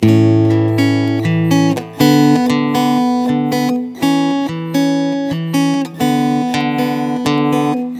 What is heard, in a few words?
Guitar - 120 BPM (A Major), Acoustic Guitar; Susan; GhostHack; Free Guitar And Piano Samples